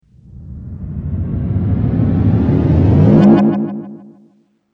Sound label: Dance Riser